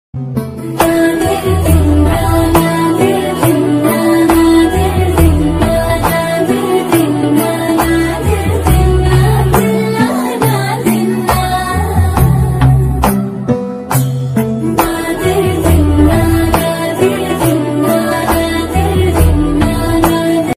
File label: bgm ringtone